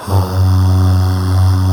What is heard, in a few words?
soul aahs